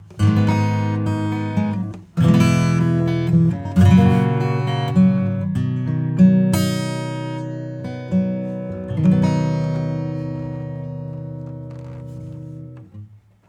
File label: acoustic; smart reverb